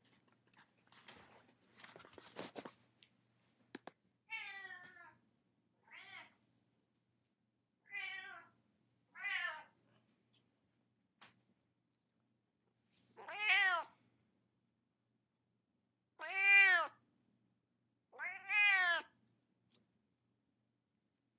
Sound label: meow; Cleopatra